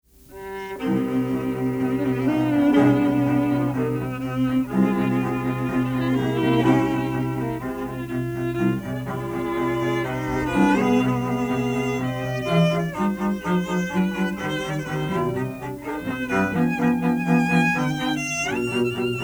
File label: plays viola; Beethoven Op.18 No.4; Short excerpt